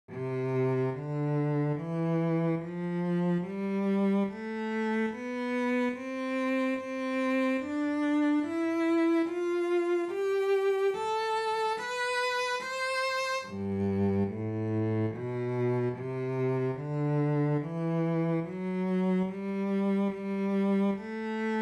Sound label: Major Scales Two Octaves, Bass